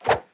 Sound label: flip